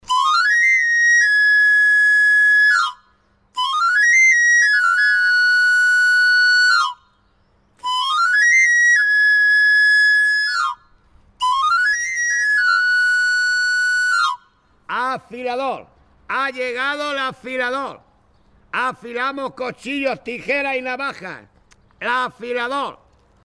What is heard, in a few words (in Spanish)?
Afilador